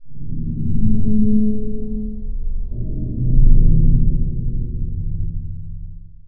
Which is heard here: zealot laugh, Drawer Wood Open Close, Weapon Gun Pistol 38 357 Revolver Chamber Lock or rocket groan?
rocket groan